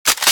railcanon draw

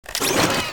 cart spindown, weapons

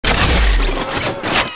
cart second clipout, weapons

